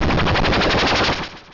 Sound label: Transform; battle anims; PokeRogue